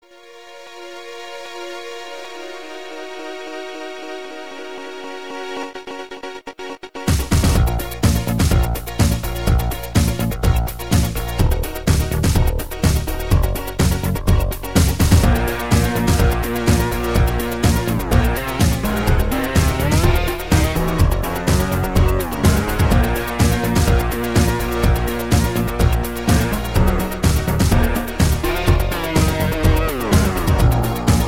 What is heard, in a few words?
High Score, Amiga; Assimilation; Moonchild